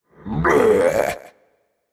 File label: tongue; smite; olorun